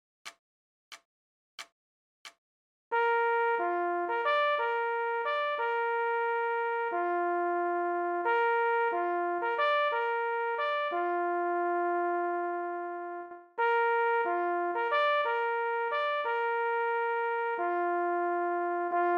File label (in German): Leggiero - Signalhorn